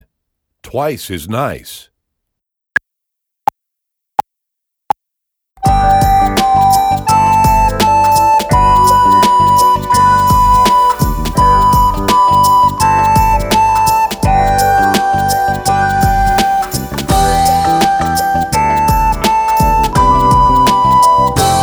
com flauta guia; Obra Obrigatoria Nivel 1; Concurso de Flauta de Bisel

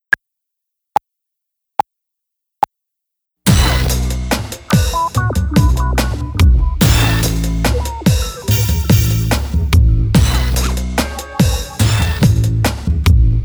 Eight Note Groove Sem Flauta Guia; Nivel 1; 1.o Ano de Escolaridade; Obra Obrigatoria; 9o concurso de flauta de bisel municipio de redondo

